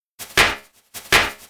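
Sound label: SNARE drum and bass 160; eJay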